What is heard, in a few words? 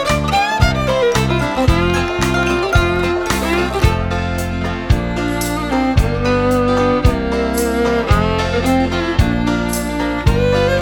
Hoedown